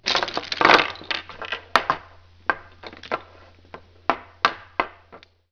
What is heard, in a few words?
new game; flutter chinese chees